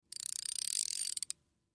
Fishing; Reel Reels In And Casts Out Line, Various.